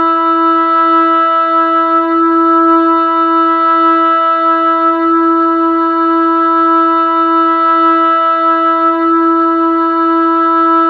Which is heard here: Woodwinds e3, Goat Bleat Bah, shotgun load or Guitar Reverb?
Woodwinds e3